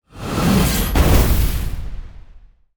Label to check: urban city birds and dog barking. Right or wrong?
Wrong